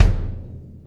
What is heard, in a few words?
Kick Shard, Processed Drumkits